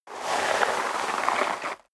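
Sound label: step dress boots gravel light slide long, footsteps; schritte; menschen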